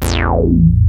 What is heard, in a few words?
ARP 2600 E2, synthesizer; Hollywood Edge - Giorgio Moroder Rare Synthesizer Collection